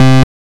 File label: BASS